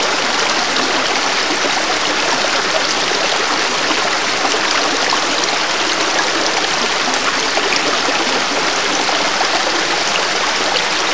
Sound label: water stream falls; Call of Duty Modern Warfare